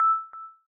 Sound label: rolling thunder ding